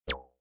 Multimedia, Blip, Blurp, Button, Menu, Rollover, Web Page Or Flash Animation Sound; Beep